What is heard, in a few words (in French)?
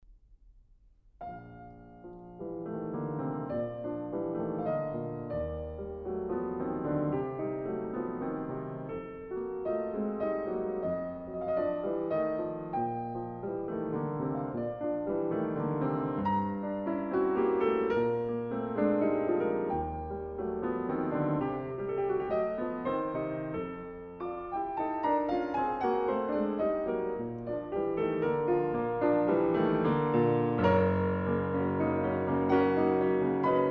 prelude en sib majeur cantabile; chopin frederic